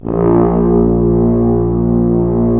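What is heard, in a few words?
brass tu, Roland; Roland LA Composer vol. 4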